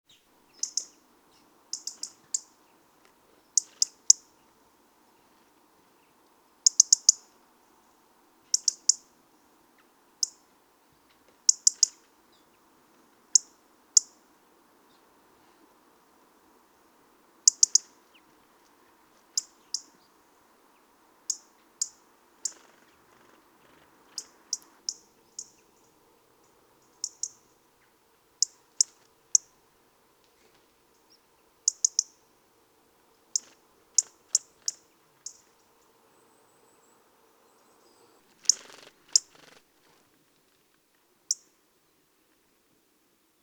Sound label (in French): rouge gorge appel